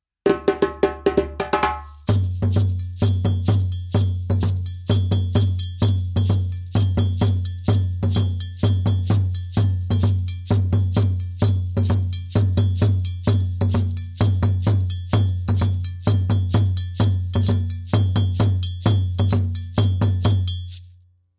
makru dununba; rhythms

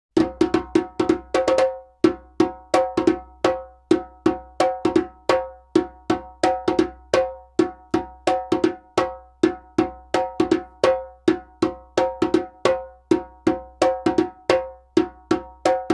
makru djembe; rhythms

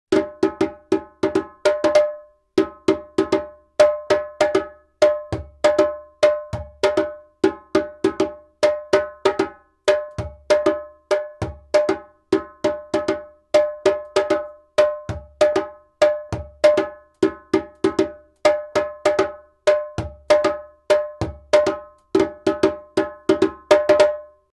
kuku djembe; rhythms

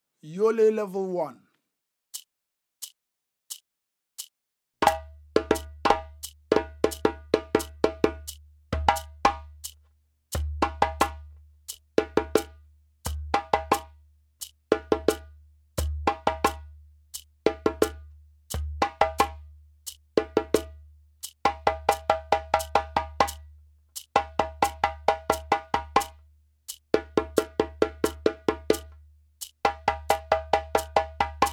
djole solo; level 1

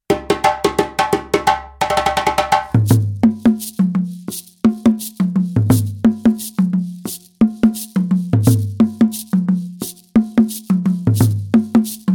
yankadi dunun ballet; rhythms

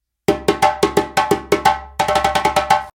yankadi signal; rhythms

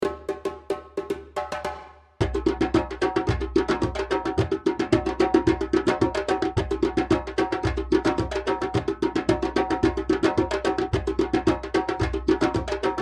djole djembe all; rhythms

